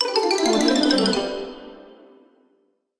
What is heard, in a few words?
funny fail; backgroundsounds